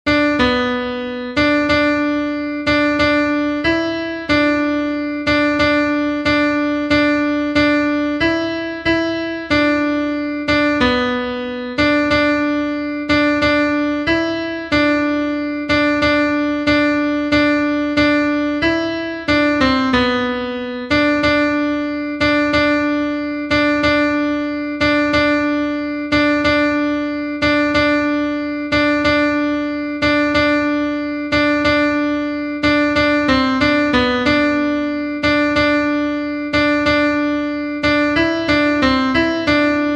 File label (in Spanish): CONTRALTO, himnos en piano; cantos del camino